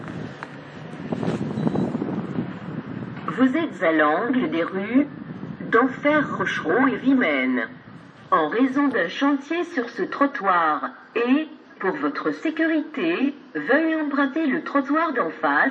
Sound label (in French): panneau de chantier sonorisé